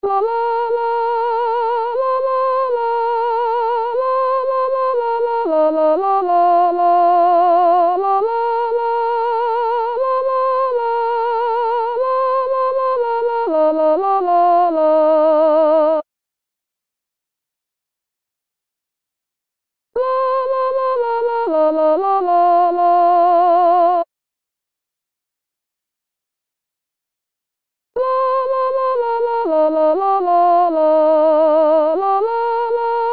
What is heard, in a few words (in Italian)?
free at last soprani